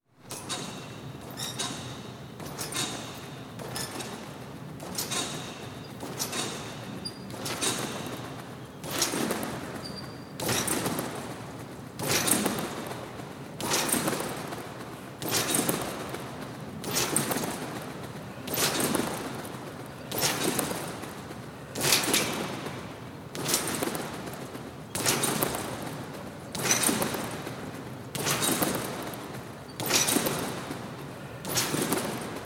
Trampolining (using mat often found in schools); Miscellaneous Sport; Miscellaneous Sports; BBC

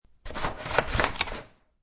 Scissors Quick, Quick scissor cut.; Quick Cut with Scissors; Offices

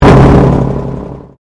Convolution Pulse

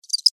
Cricket Chirp, Single, Insect; Animal; Bug; Cricket Sound Effects; BlastWave

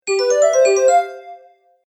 Magical Message Notification; Musical

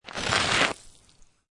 Snow And Ice Hard Snow Or Ice Scrape Debris; Weather

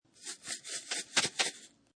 Shake Coffee Jar; Food (Sound Effects:Household)

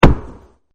Jungle Tree Call; Nature Scenes; Forests and Jungles; Sound Effects:Backgrounds; Partners In Rhyme

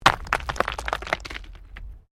STONES MOVE

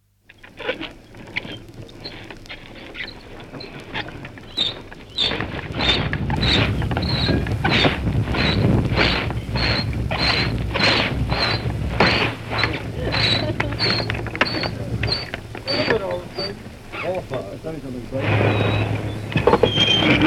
Hoisting a sail (from 78 disc); Boat; BBC

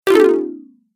Falling Fail Fast, video game; Twistedtracks

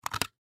Camcorder Lens Cap Remove Fast, Sony Mini Dv; Camcorder Sound Effects; Technology

